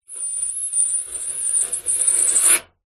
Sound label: foley metal scrape sharp squeak shrill med